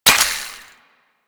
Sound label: Reload, Weapons; Warframe; Tenno; Miter